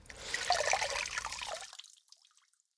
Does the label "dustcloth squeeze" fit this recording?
Yes